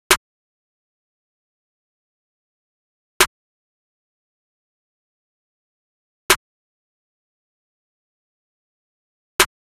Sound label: Drum Loop 155 BPM Snare, Trap; Loop Stems; Ambush